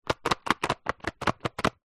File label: office chalk sticks in plastic container shake; zapsplat